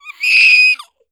VOICE Girl 4yo Scream; don t be afraid the first toy

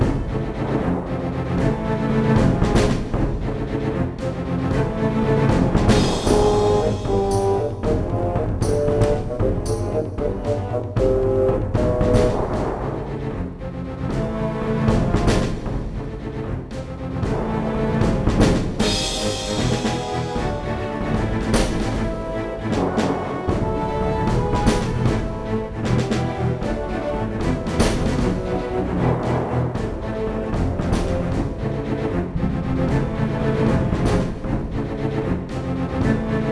Duel Music Neutral; sid meier s pirates 2004 pc

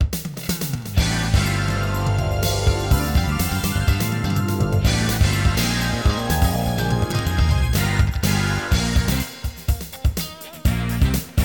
Congratulations!; SegaGT2002

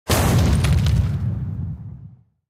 Comet Boom; Tank Stars; android

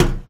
footstep metal low run; ProSoundCollection v1 3 MONO

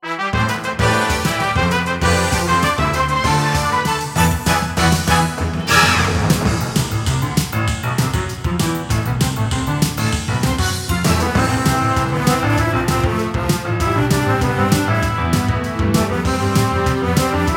Ordeal! Orchestral; Team Kirby Clash Deluxe

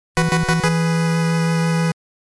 Fanfare (Recruitment); fire emblem the best vol. 1

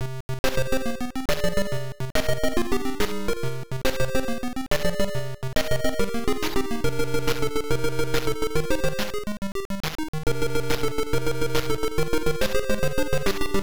Metal Creature; hydefos hyper defending force system msx2 psg

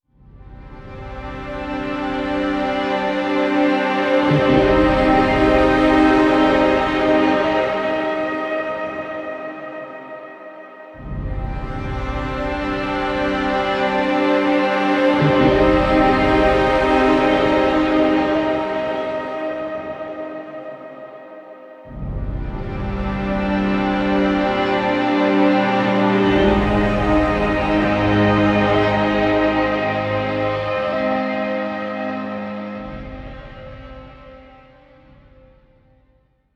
Suspense

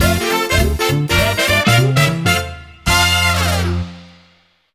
Course Clear; super mario maker 2

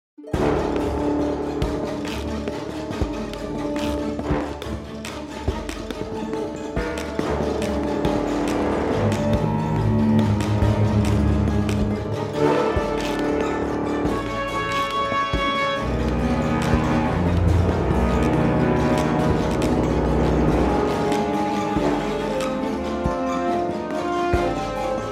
Loop Fight

